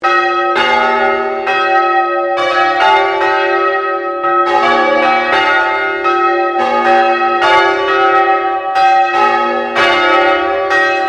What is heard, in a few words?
Bell St Pauls Cathedral Melbourne Bells Sequence Long; The bells of St Pauls in Melbourne; Musical